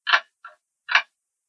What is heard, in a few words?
dog bark